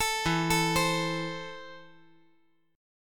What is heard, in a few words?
E Suspended 4th Sharp 5th